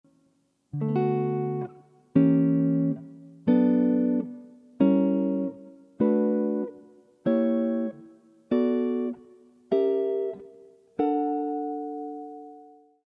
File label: Maj 6th and Dim Chords, jazz chords; Barry Harris harmonization